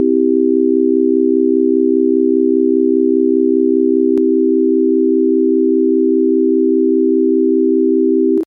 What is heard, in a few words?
Cmaj, just tones